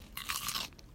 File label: crunch